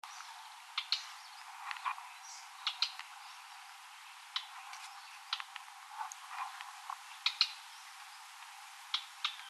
White bellied Redstart Luscinia phaenicuroides, white bellied redstart call